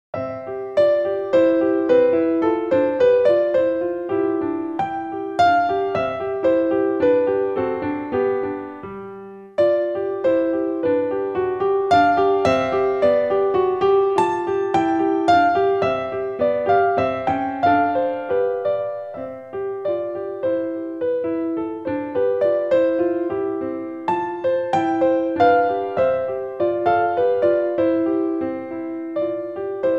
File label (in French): Mélodie, piano; SCHUMANN; Op. 68